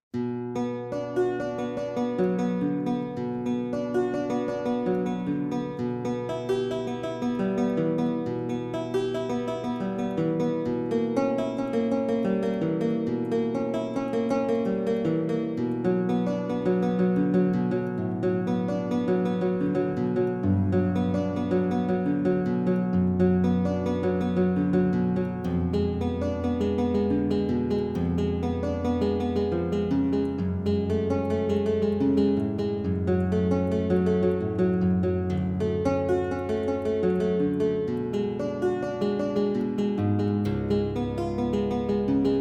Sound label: German Lautenwerck; Praeludium III; Johann Sebastian Bach